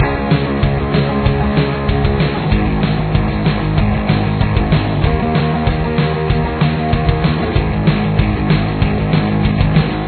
chorus, guitar

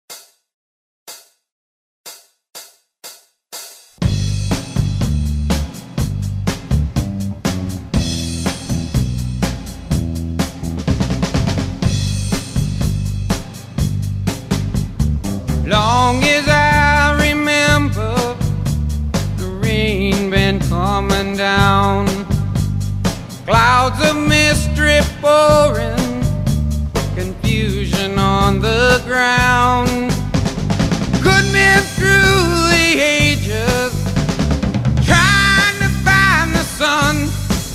backing track with vocals; E-Z Rock Guitar

